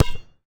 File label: Street Ball Bounce Asphalt